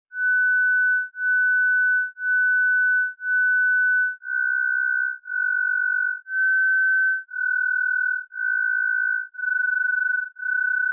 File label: THROB